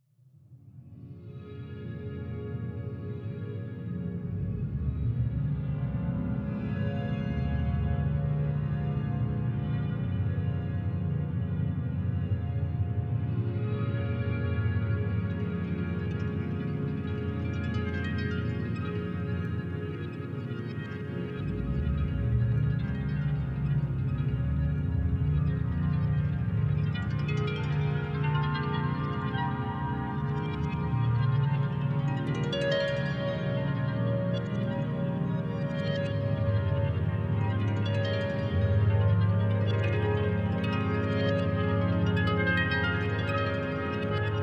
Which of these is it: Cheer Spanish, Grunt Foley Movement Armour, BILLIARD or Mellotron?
Mellotron